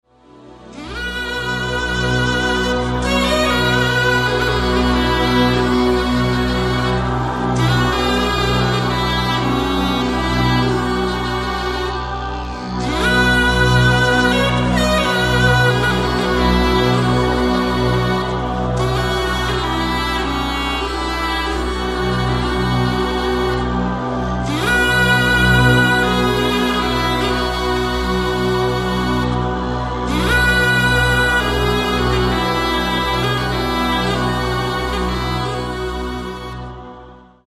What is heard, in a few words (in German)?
woodensax sopran